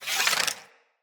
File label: Bow Charge Power; Weapons; Player; Bows; Fey Thorn Bow